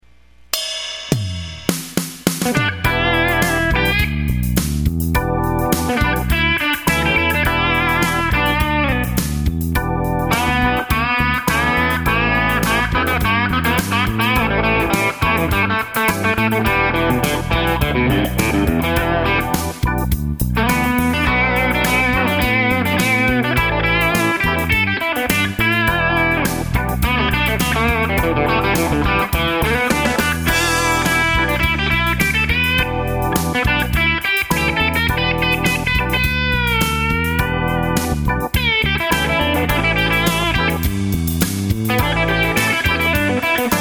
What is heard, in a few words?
Blues